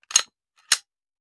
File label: Hand Gun Foley; Sound Ideas; Guns